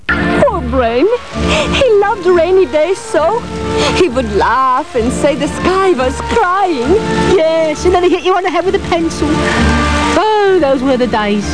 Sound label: rainy